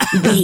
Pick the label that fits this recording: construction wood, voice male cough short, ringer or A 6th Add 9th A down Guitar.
voice male cough short